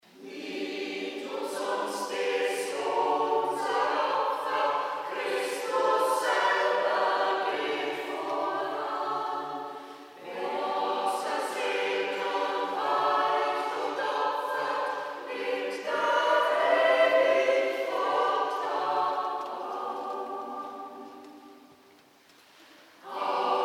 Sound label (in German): Sanctus steirische Mess; Muthspiel